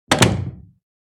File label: Door close; Devour